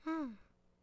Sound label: Setlr Child Fidgt Vox; Civ4 warlords